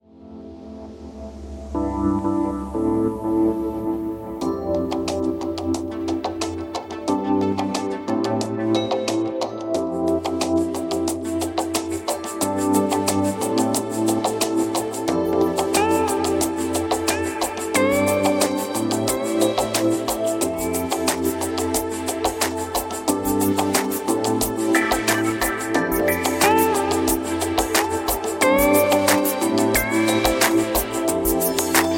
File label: chill mix